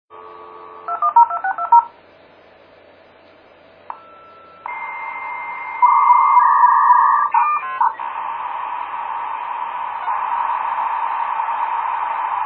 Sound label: Modem